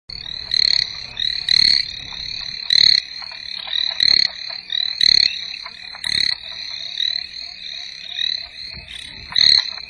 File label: Hyla chinensis